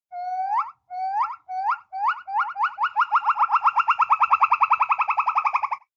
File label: Monkey Around